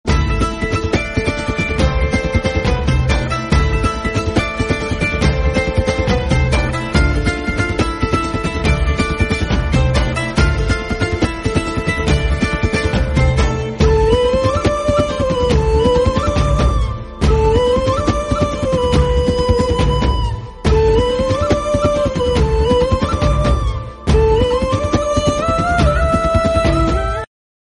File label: bgm